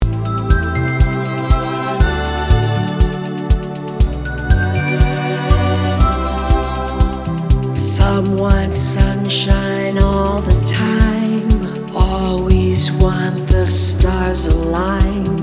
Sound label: 9B 120 Melodic House